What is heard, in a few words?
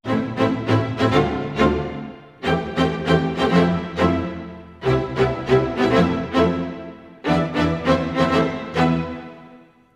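100 strings, A minor, Orchestral arrangements; PowerFX - Downtown Orchestra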